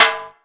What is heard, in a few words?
JAM TIMB, Ragga & Dub Reggae; USB Soundscan vol.27; AKAI